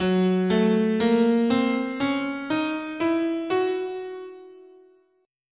F sharp Hungarian Major, scale

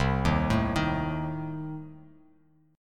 C Minor 6th; arpeggiated; JGuitar

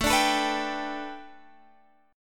A 7th Suspended 2nd Suspended 4th Guitar Standard; x,12,12,14,12,15; A7sus2sus4 strummed; capo=3

